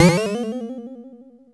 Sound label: Perc, Nord Modular Drum Samples; Yawgmoth